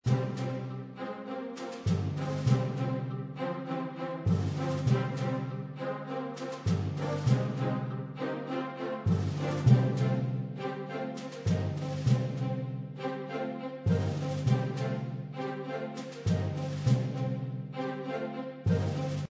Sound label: Urgent Welcome; Boom Beach